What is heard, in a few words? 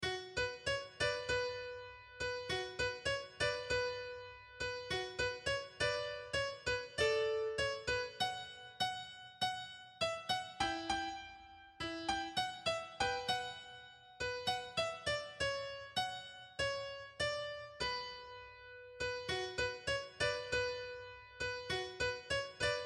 bella ciao violon facile